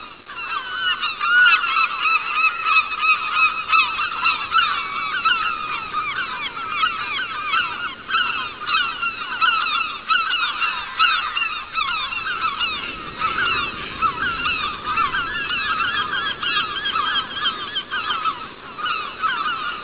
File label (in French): mouettes